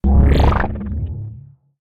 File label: Pickup